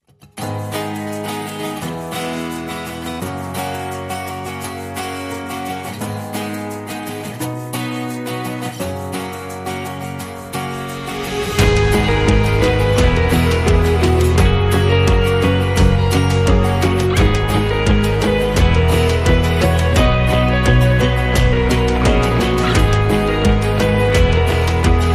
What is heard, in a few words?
Guitar Hero Ode